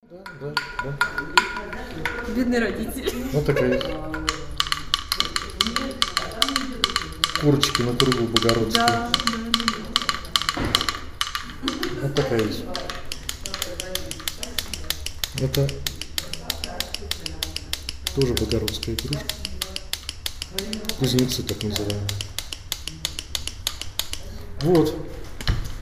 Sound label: wooden toy; sergiyev posad